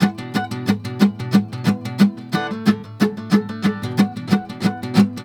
GUITAR, Balkanish Traditions

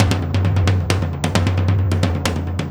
Tom Particle, Processed Drumkits; Zero Gravity Beats; Particles

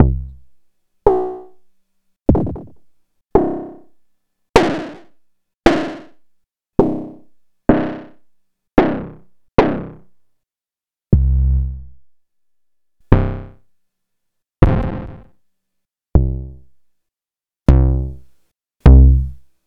Kick Bodies

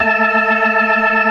ORGAN ALL STOPS; Keith Emerson Signature Series

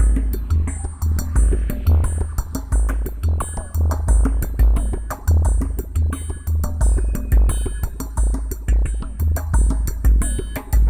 World Atmo 88, Percussion Combos; M-Audio; Pro Sessions VOL-38; Abstract World Fusion 2

